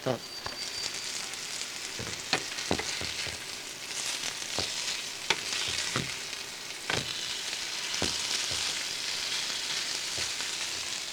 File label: cooking